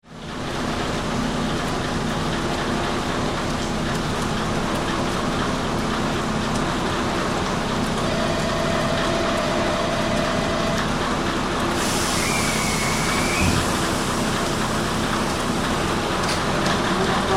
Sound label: rain